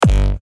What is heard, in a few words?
kick; misskey; noizenecio